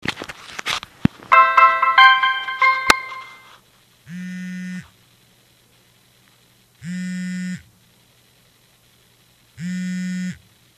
WORST SOUND EVER

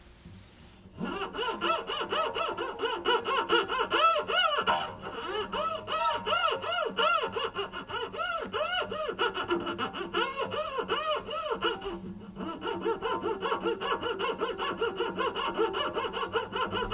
Guitar; Me trying to clean my guitar strings, and my aunt being very being very annoyed by it.

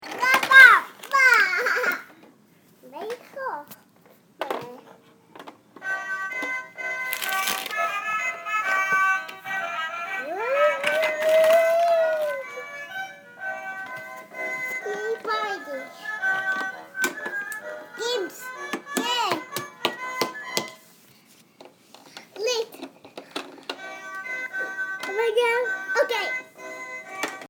Child Playing; Location: At the house of the child I nanny; Child babbling, toy pirate ship song

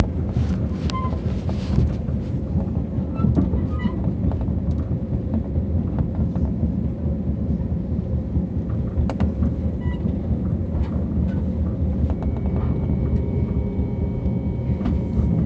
train

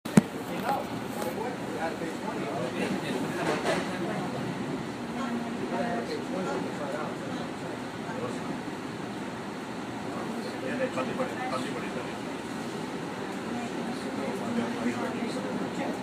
NJ Transit, train

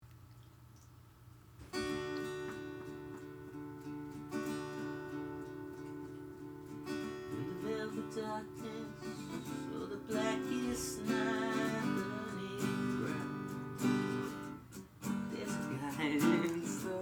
laughing

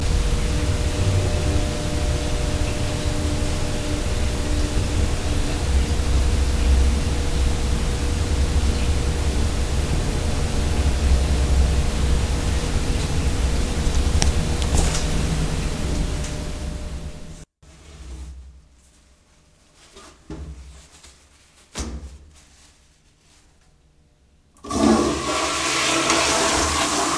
everyday sounds, faucet

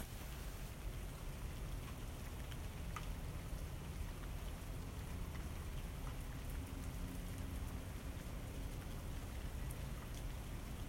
Rain Recording; Driveway of my house at 3/1/18 at 9:45. You can hear rain drops hitting the ground

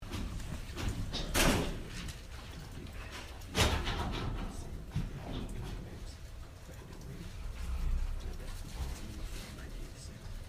Hammer Lab

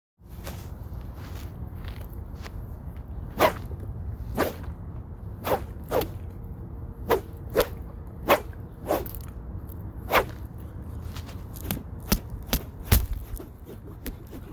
Stick Song

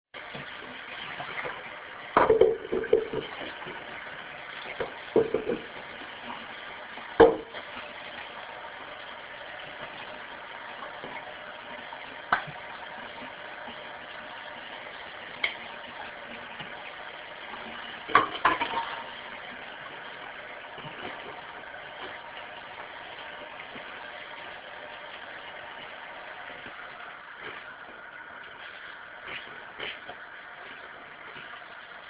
Field Recording, Dishes

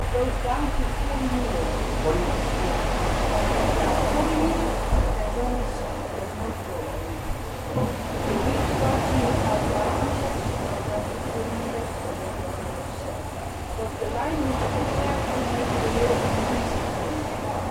BlueChairs, water; bathroom

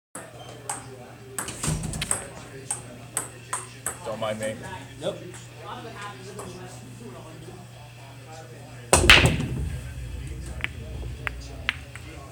Game room Pool Break

